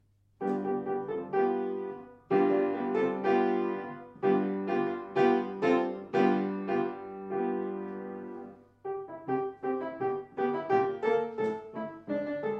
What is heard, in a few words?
Mice piano